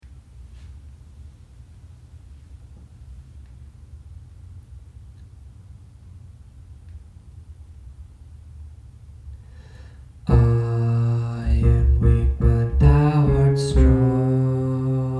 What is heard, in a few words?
bass